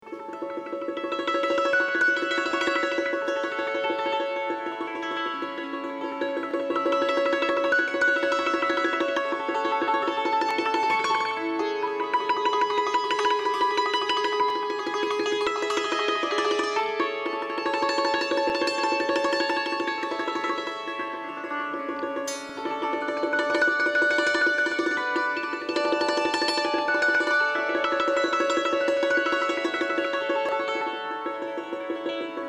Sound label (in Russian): cymbaly